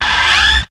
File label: HIT, STABS, House